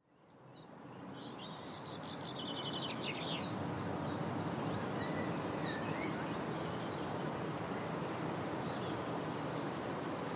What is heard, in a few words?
garden soundscape around a bird feeder